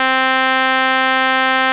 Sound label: brass, SQ80